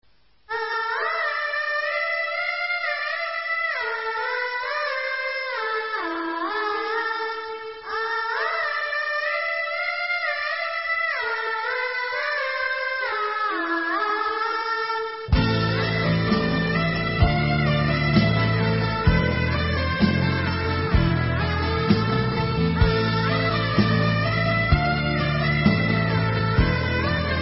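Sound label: Instrumental, Israeli Dances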